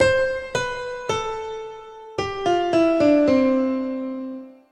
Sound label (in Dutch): gehoor dalend